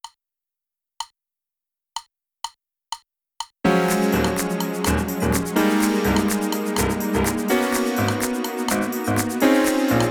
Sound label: Fusion drumless